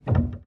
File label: piano release